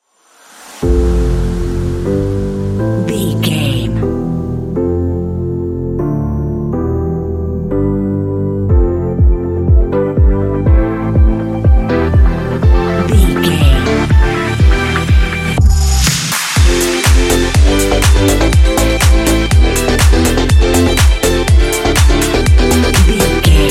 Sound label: tropical house intro